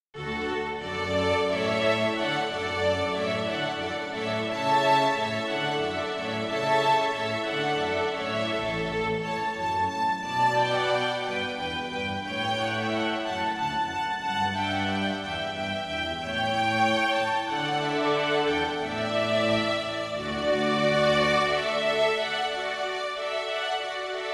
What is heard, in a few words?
Water Music